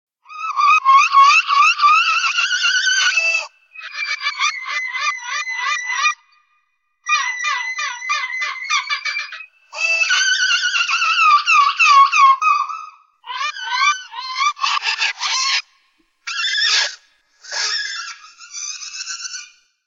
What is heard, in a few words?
planet; background; TOS